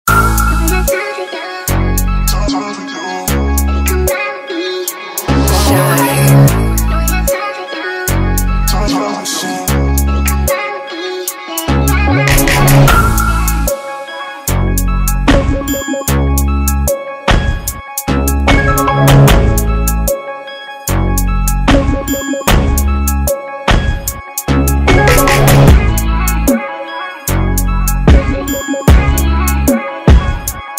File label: beat